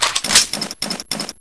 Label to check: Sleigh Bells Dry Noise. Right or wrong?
Wrong